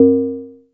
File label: DULL, PERC HITS; Zero-G Ambient 1